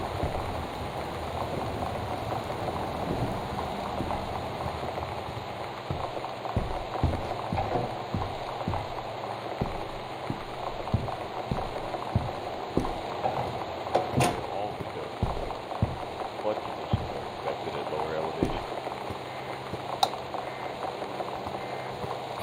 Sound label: room setting